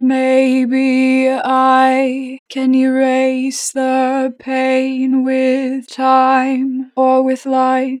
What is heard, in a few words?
Natural Vibrato, Vocals, Maybe I, 4 bar vib; Harmor Vocals